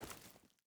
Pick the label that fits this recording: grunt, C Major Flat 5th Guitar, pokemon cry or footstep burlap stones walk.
footstep burlap stones walk